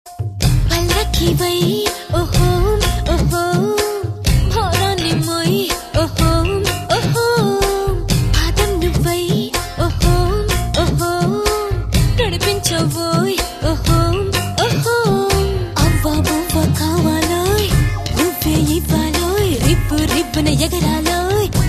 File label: Telugu Ringtone